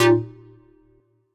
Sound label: stab nice, stabs